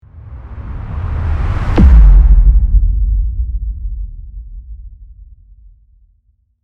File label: Deep intense boom rumble; cinematic